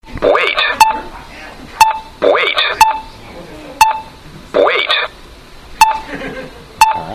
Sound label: aps wait tone